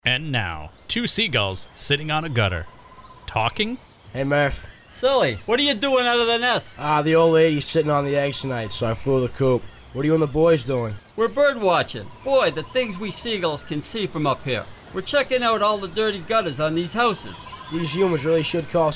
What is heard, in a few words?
seagulls